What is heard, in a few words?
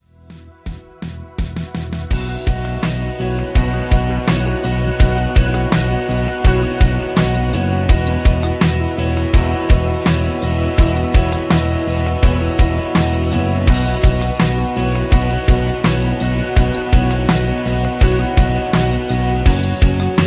rhythm